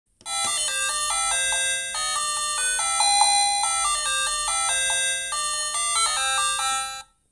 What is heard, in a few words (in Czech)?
melodie